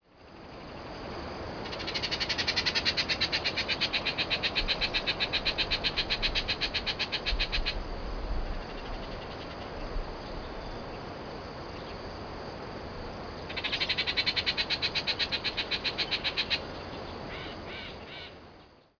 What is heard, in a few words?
bullocks oriole